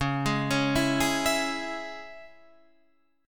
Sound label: C sharp Minor, Guitar, Half Step Down; chord